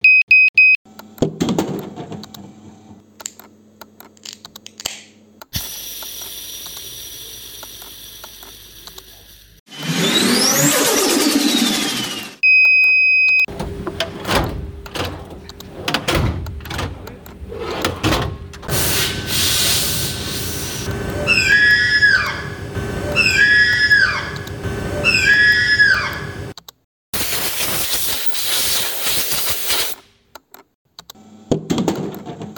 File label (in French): identite sonore; le departement gmp compose par les etudiants